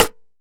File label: Percussions